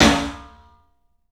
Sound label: CLUB SNARE; 3D Sound; 3D Drums